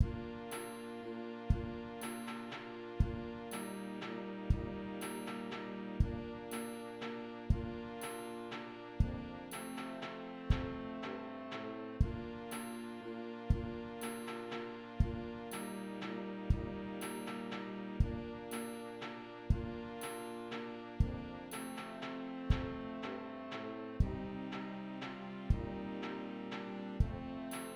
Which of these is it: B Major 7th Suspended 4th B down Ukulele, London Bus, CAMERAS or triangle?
triangle